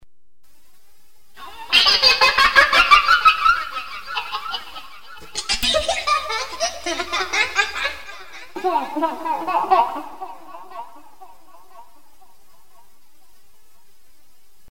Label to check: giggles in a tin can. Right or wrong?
Right